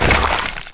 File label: gib; Hexen 2; player